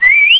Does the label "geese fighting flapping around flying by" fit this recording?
No